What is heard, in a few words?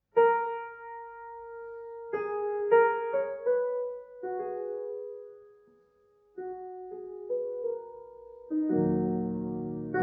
Prelude es moll; Scriabin; Op16 no4